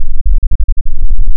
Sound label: FM Wave SY77; logue-sdk